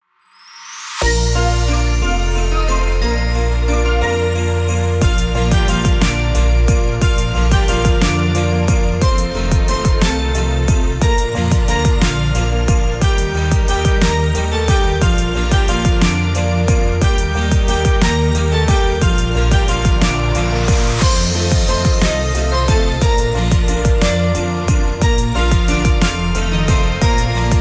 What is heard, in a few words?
silent night background christmas music